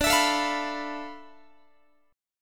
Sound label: D Minor 6th Add 9th D, Guitar; Dm6add9 strummed; x,22,19,19,21,22